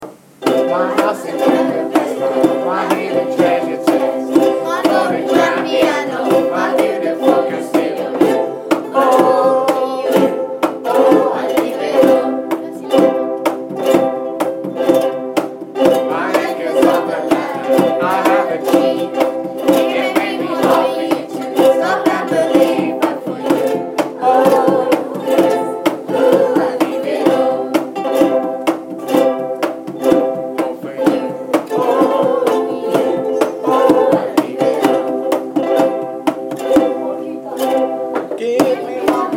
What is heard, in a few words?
ukulele's